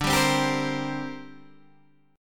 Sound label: D 7th Suspended 4th Sharp 5th down Guitar DADGAD